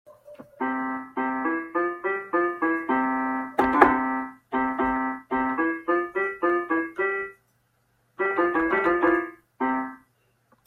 piano; amogus

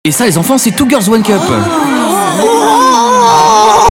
wooaaaa; Antoine Daniel

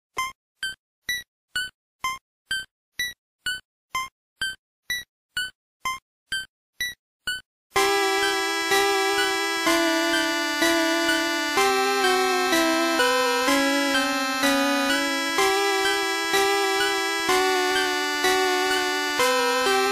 pokemon musica original

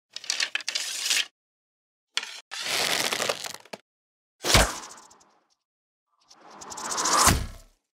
bow and arrow shooting at target; Bow shoot and hit

